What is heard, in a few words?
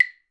CLAVE DRY; InVision Interactive; Percussion Slam